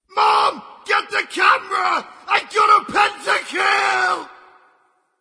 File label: alert notify kill